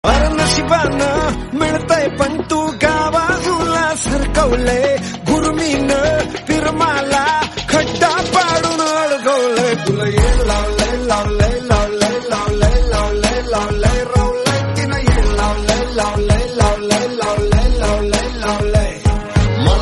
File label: Instrumental